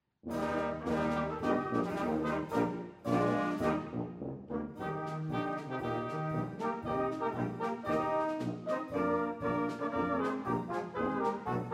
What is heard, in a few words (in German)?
Marsch